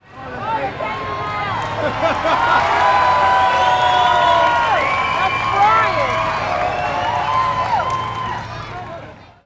crowds

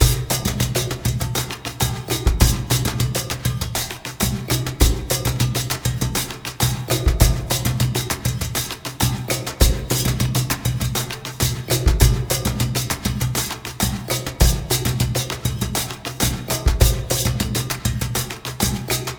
percussion brazilian rhythm ensemble

